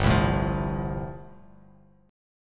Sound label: G 7th Suspended 2nd Suspended 4th, Bass Standard; G7sus2sus4 strummed